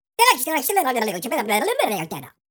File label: babble